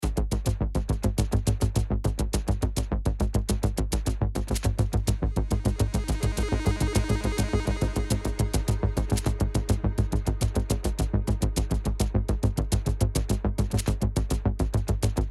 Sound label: Menu loop full; Hyperdrive Massacre